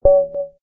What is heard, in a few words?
victory; chess; online multiplayer chess game